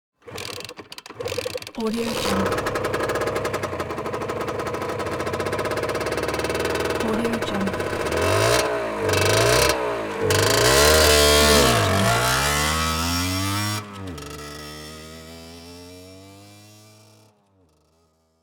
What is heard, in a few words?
motorcycle start and running; moonaudioproduction